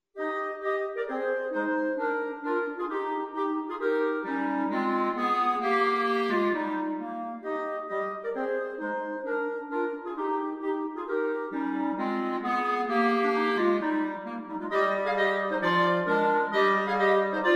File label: Country Gardens Clarinet Trio